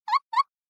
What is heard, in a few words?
Horror Laugh Dry